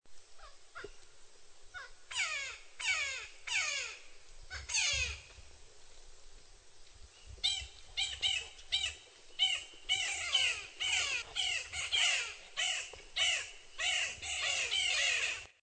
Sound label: Carpintero Gigante, Magellanic Woodpecker, Campephilus magellanicus; cantos